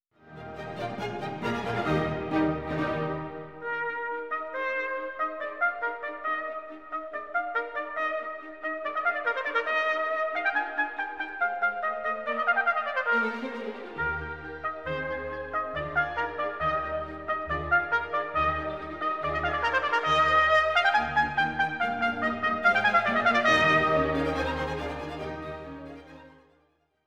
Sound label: Trumpet; Haydn; Audio Quality Immersion Examples